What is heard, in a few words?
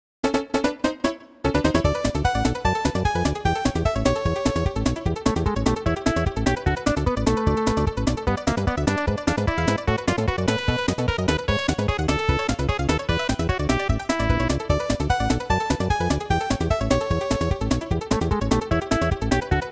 Athletic SNES Arrangement; Super Mario Land 2; sml2 athletic remix